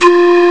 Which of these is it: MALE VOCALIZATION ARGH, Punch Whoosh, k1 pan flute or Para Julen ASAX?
k1 pan flute